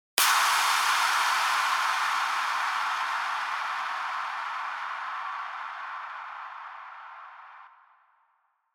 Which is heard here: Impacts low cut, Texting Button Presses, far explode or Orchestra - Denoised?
Impacts low cut